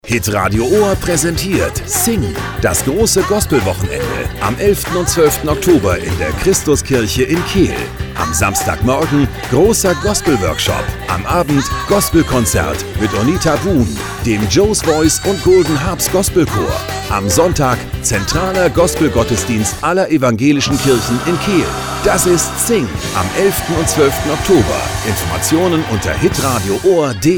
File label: Promo Sing